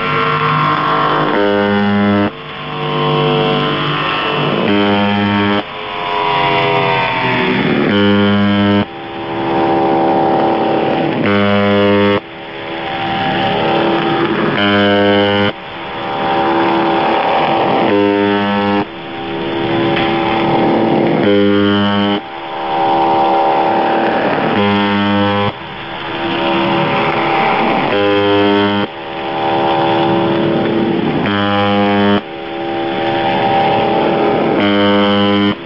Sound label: Failing generator, Russian military voice stations